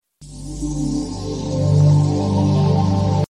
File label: ios futuristic text tone